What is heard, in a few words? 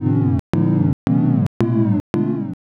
laugh crowd